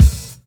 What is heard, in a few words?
KICK, Hip Hop